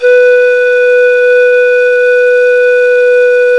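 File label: pan flute B3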